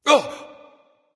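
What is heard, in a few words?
nonverbal surprised; smite; voicepack; ullr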